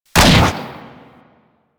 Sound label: Bronco Fire Shot 2D; Warframe; Weapons